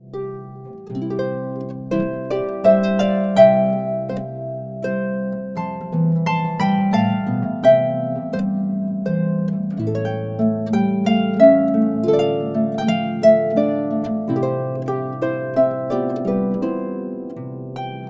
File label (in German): Tänzchen; Salzburger-Tänzchen; ADVENT